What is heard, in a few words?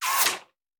Bullet Impact; Sound Ideas; Guns